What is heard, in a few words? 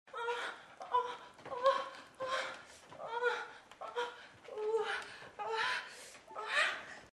sex, moans; The Witcher Hunt